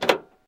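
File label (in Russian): короткий приглушенный отрывистый звук автомобильной двери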